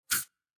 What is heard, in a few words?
Spray deodorant